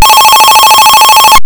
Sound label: ringtone, Phone; DeforaOS Project